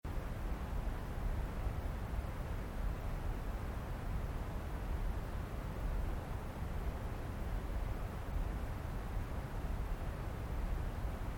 air noise, ambience

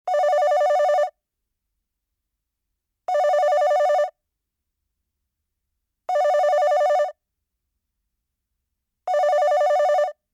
telephone

